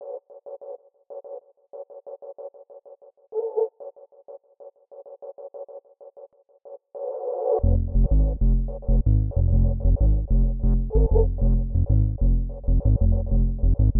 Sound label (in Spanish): Bounce chacarera 95bpm